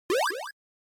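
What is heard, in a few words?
Game Boy General Pitch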